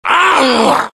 knight pain; halloween boss

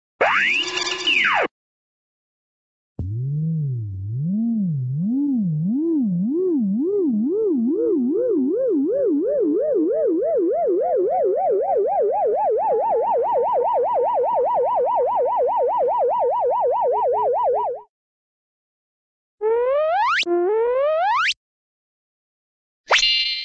electronic weird electronic signal; cartoon

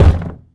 underground cube soft

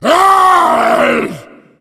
tank fire; Left 4 Dead; Source Engine

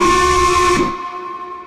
jungle alarm; Source Engine; tf; lair

